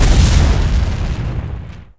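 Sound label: EXPLOSION BIG; Shadow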